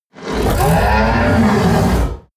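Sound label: coloss shout angry deep, creatures; kreaturen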